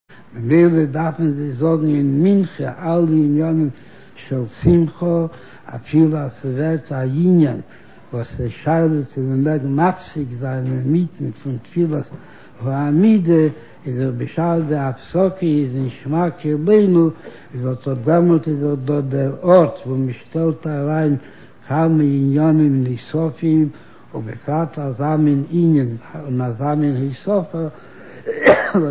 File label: hebrew shma koleinu